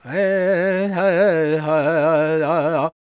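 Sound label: E minor, World Voices, Lakota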